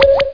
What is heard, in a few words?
Stone Ramp; Loony Labyrinth 1.02 Demo